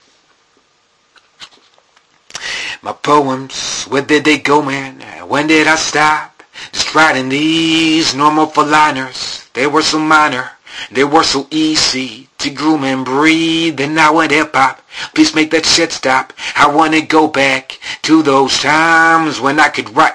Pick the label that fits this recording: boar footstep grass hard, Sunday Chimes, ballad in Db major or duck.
Sunday Chimes